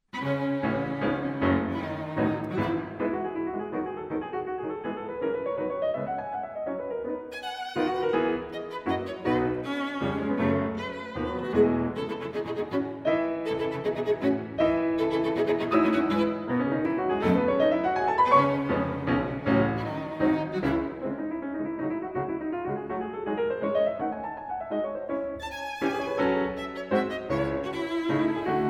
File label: piano trio; dubois